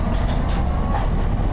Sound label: Motor, Train; OpenBVE